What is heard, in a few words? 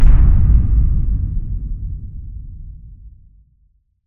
deep space explosion